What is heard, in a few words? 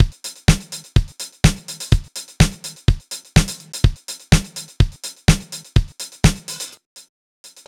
DRUMLOOPS, Disco